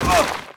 FALL, Rider

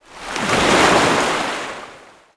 Waves, ambient; Totaled; XBOX

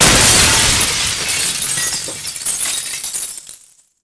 shatter Glass; impacts explos

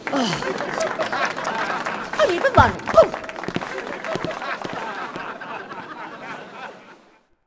dance outro bad; Sid Meiers Pirates; XBOX

